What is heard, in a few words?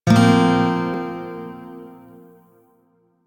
Shawzin Blues Chord C; Warframe; Lotus Shawzin